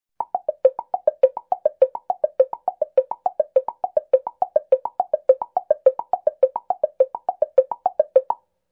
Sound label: Woodblock down arpeggio